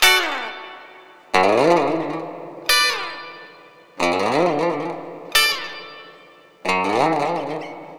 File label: Lounge Gtr D; Zero-G Kocktail Kollection; Construction Kitz (Lounge); Welk Lounge 090